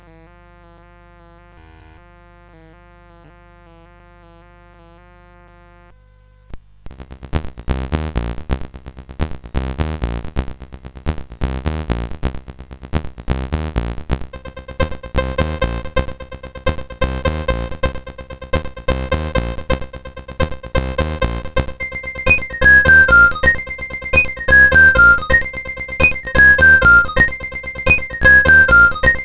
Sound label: The Beat CSG8580R5